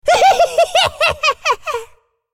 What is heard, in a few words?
laugh